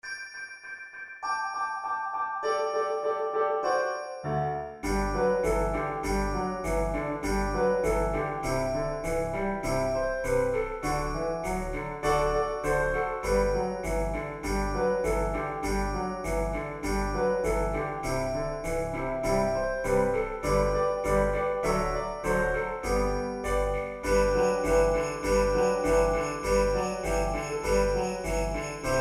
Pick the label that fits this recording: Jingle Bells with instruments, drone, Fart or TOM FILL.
Jingle Bells with instruments